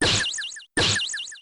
Dizzy Punch; battle anims; pokerogue

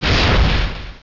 Storm Throw; PokeRogue; battle anims

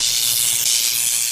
Electricity; PokeRogue

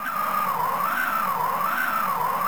low hp; pokerogue

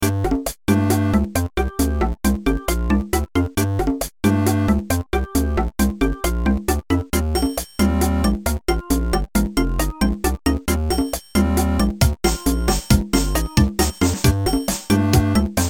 cave, bgm; PokeRogue

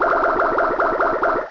Bubble; battle anims; PokeRogue

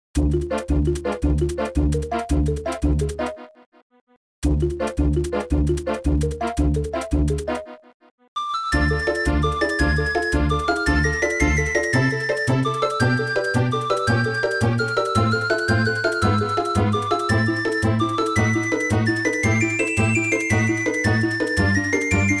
forest; PokeRogue

